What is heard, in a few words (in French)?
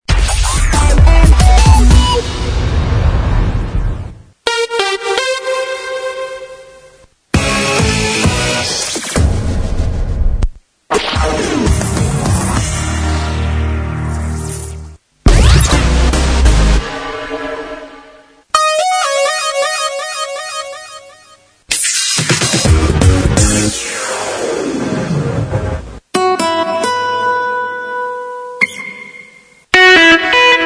LOGOS SONORES TOUS STYLES